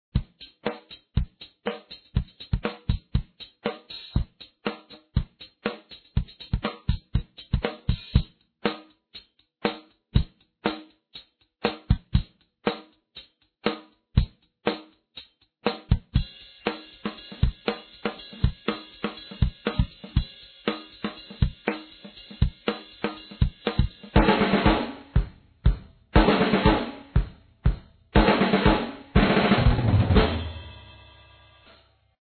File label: Drumsticks - Festive Maracas - Green and Blue Maracas - Conga Drums